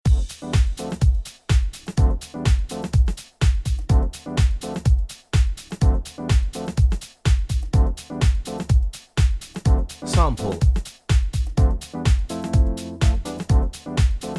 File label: corporate